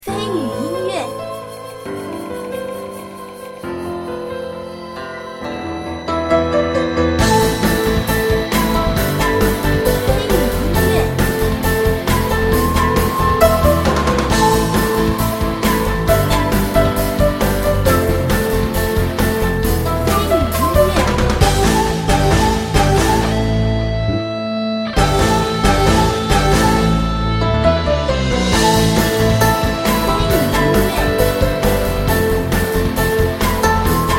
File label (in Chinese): 忧伤 抒情 激烈